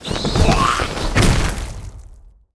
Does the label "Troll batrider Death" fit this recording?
Yes